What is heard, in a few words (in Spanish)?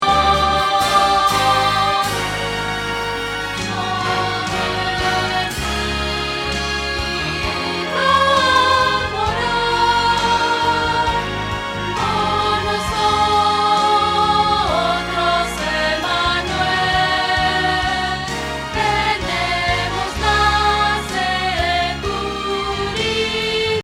Contralto